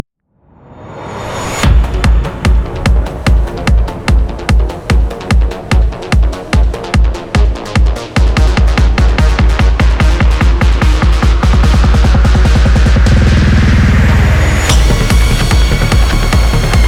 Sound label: music failed-necromancy; Necrobarista Final Pour